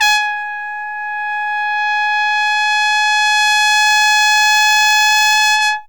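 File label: crescendo trumpet sforzando; Zero-G; Phantom Horns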